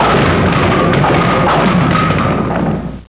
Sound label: bowling pins